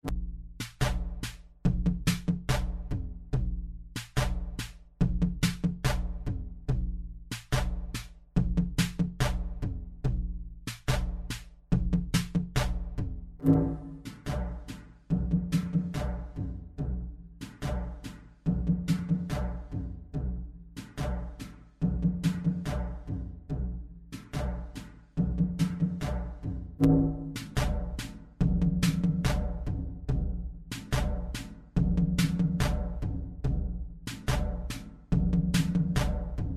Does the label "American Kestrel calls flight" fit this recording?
No